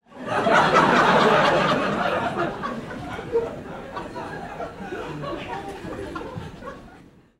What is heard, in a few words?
human audience laughter comedy club x200 people